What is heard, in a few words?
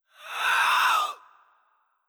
Skel Drake Elec